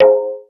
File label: think ping